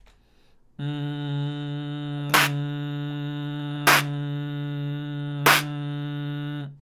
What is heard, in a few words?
clap; koeari n tenashi; 「同時に声に出す」という練習